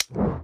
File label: Click Small Game Cancel